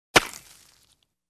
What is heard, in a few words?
Smashing stone with a pickaxe heavy